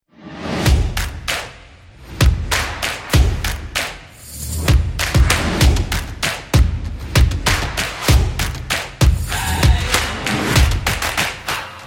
Dynamic Opener

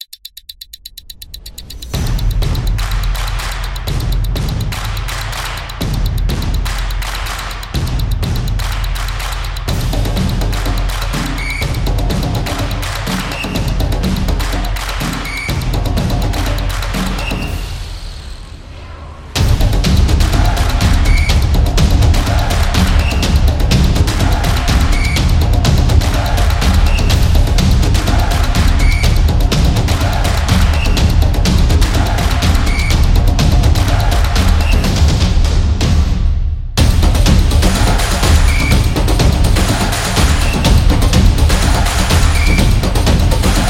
Football Music; sport drums

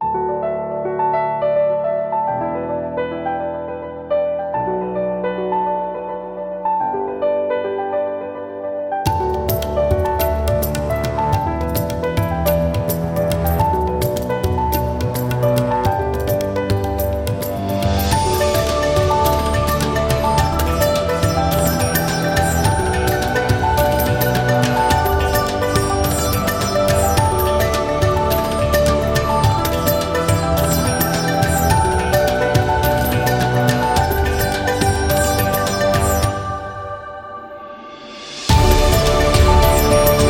India Cinematic Adventure

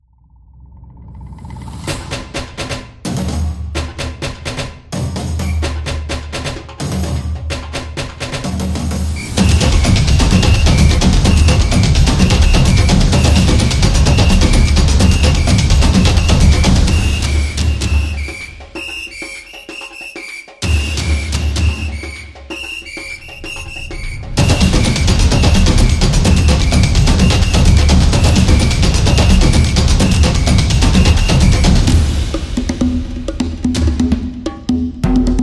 Samba

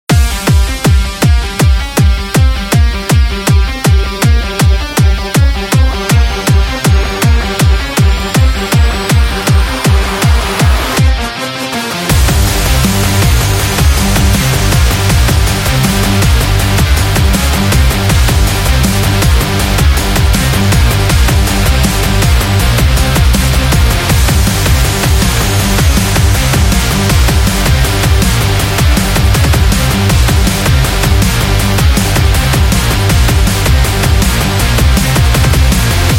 Dubstep Rock

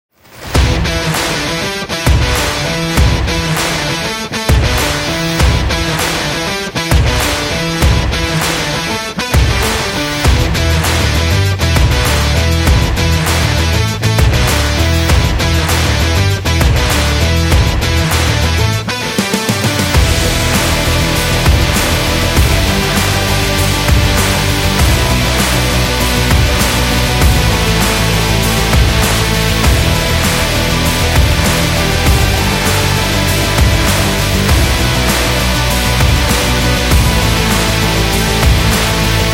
Energetic Action Rock

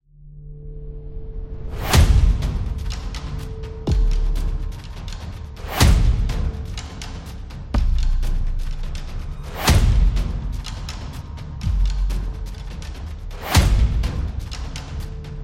Military Action

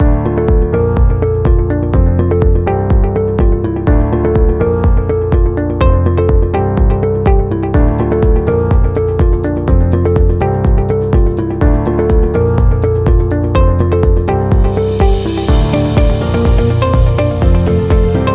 Loop; hi tech

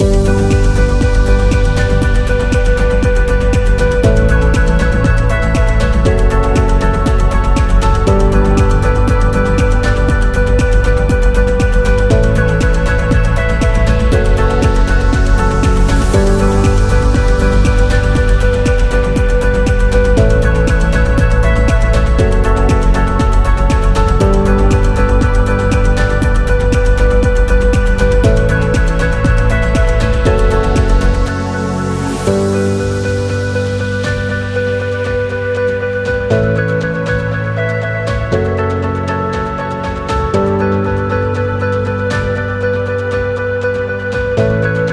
Podcast Loop

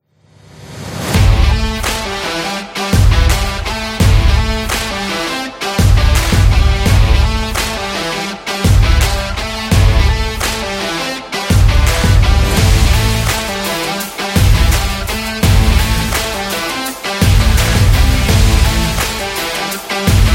Indie Rock Guitar

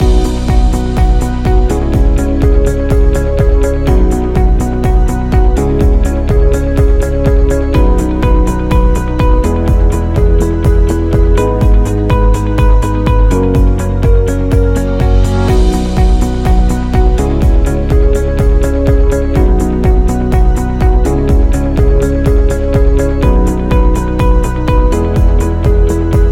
Loop Background, Corporate background music

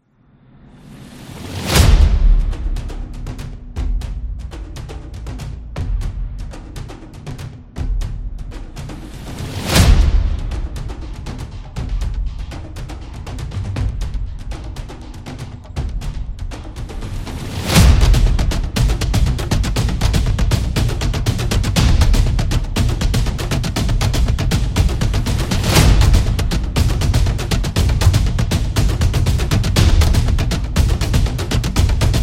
Military

